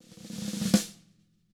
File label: TAM ROLL, SNARE ROLLS; ILIO; Double Platinum Drums 2